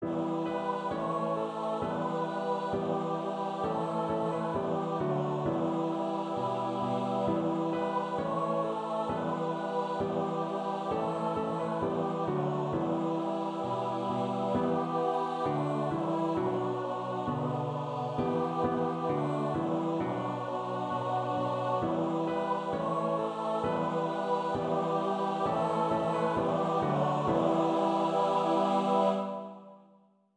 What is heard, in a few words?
Noel Nouvelet